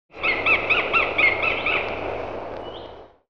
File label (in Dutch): havik Nederland